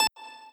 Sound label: lead